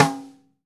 Radio King Edge, Snare One-Shots, Drums; Sonic Foundry (Sony Creative Software); Vital Drums

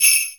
SLEIGH BL, Korg EMX-1

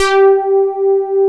ATT PAD

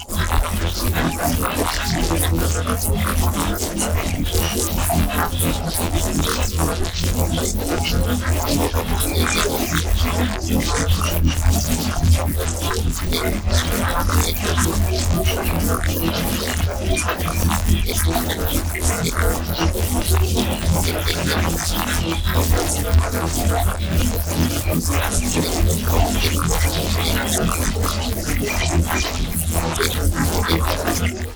Bass Granulize E2

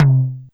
RSF DD Tom, DD-30

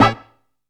SAXUAL STAB; HITS+STABS; Zero-G; Funky Elements

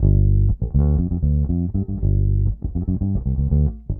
Bass, 70s Analog Funk

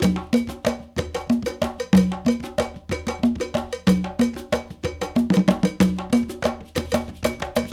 Bembe 6/8, World Dance; Spectrasonics - Supreme Beats - World Dance

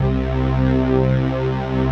AP SYNTHS; Pro-Rec - Synthsonic; JV ANALOG2

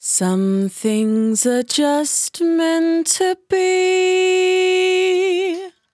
100bpm Bm, Velvet Vocal; Zero-G

